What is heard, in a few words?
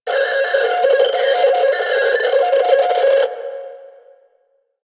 Alarm; Warframe; Lasrian; Lasrian Enemy C; Alarm One